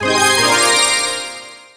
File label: job level up